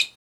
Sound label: Shoe Squeak; Ed Edd n Eddy; Adromulus Pack